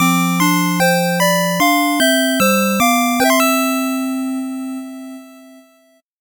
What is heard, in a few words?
8bit jingle slow